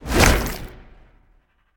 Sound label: spear throw; smite; Anhur